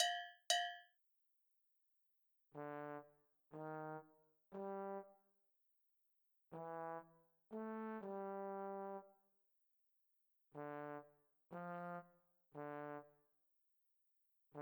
Trombone Classical

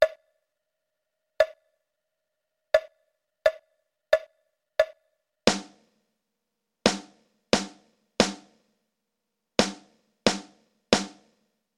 drums

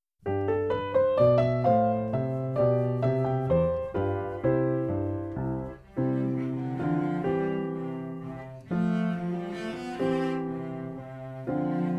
Piano, cello; Suzuki Cello Vol 2; Minuet No 1

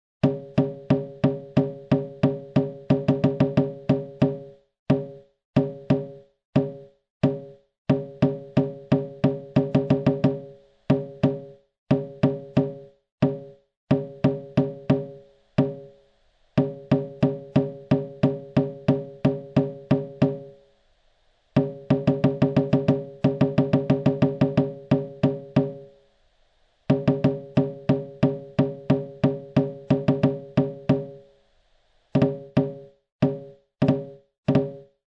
Royal Drummer, solo; Sticking With It Percussion

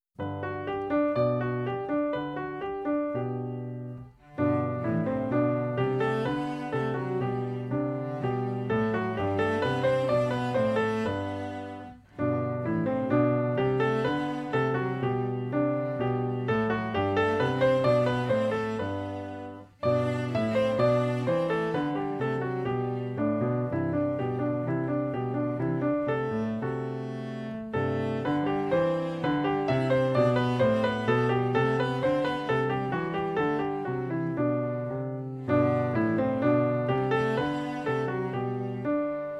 Piano, cello; Suzuki Cello Vol 2; Musette From English Suite No 3

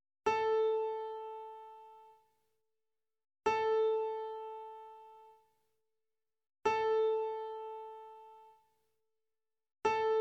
Tuning; Grade 10 Class Exam Solos; Xtras Play Mozart

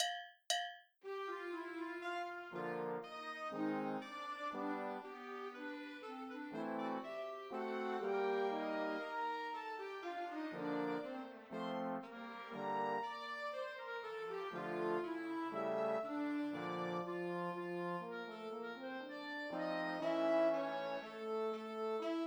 minus Bass Guitar Classical

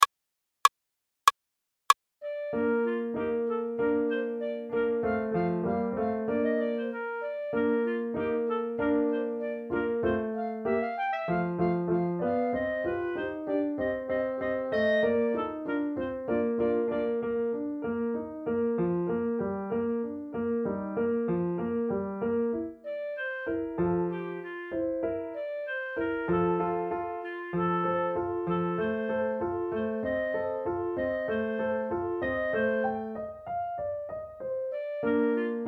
Clarinet Melody; Hadyn

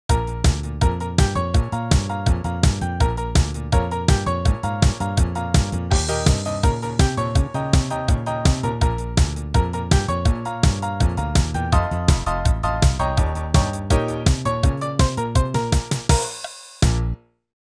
Scene Change; grease

